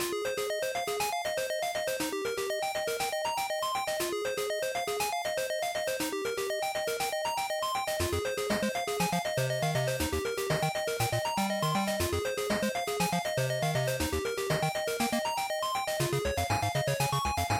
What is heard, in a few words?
Action Title Loop120